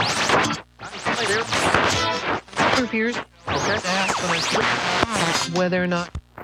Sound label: Radio Sweeps, Short Wave; Sonic Foundry; The Electronic Music Manuscript Collection; EVP Short Wave Recordings